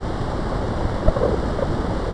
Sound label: EEG speech; ICAD2002